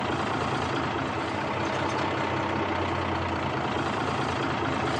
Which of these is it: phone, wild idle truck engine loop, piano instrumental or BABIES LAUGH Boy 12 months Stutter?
wild idle truck engine loop